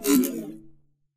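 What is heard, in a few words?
editor connection destroyed; ui; Source Engine; Portal 2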